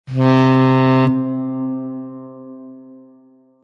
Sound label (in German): Schallsignale Binnen Kursaenderung Steuerbord